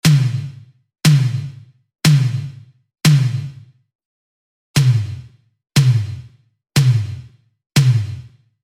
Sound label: tom pitch; waves